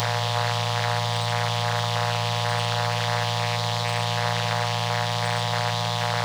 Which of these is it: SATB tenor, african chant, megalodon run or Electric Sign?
Electric Sign